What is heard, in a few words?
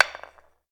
Skull Stn Hit